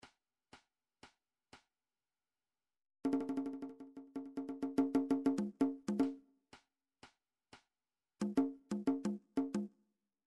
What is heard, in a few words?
drum trax cong